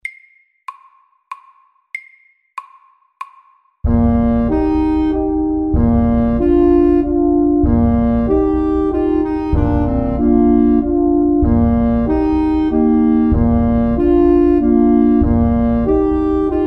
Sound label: Elegant vals SLOW 95